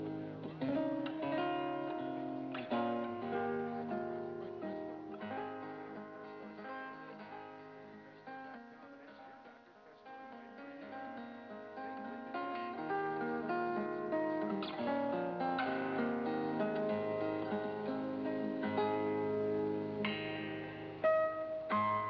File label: guitar